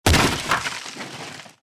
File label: Human body slam against wood door